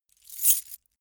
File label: metal keys handling